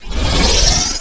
spy disguise; chicken fortress 2

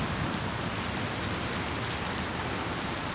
rain

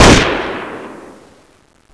an94 shoot

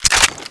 m16a4 bolt release

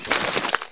mooncake draw

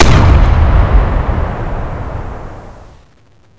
zombie smoke; nst zombie

